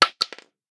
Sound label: Shell 12ga wood; STALKER; IWP